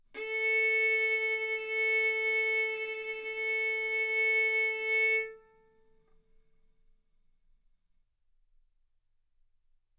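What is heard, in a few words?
Violin arco mf A string A4 B4 stereo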